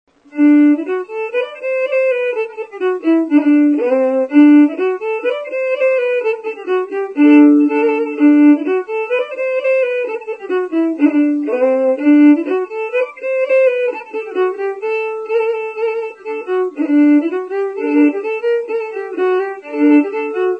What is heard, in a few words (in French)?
bourree; Dauphiné, Rhône-Alpes